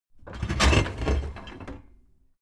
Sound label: dishwasher tray close